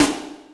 snaredrum, Yamaha RM 50

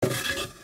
Wood slide close; Nokia Ringtones; Audio themes

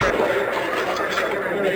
Crowded Loop; Sound FX

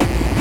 Hard Kick

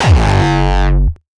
kick; shock. Ultimate Kicks vol. 2

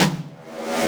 Yamaha RM 50 Toms

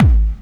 Soft, kicks

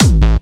Kick n Bass

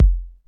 kicks, Bass Drum

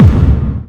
Bombo Hardcore, kicks

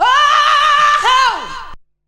ooohoe, audioloops

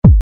kick

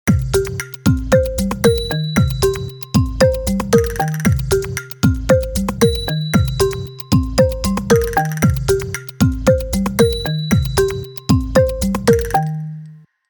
NOKIA POP; VERTU; vibes

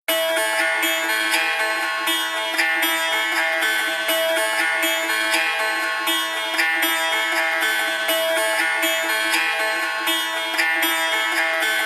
Ancient Guitar, Ringtones; Nokia Ringtones; 8800 Arte

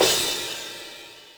CYMBAL, Yamaha RM 50

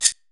Maracas, Roland S50; Drum Samples

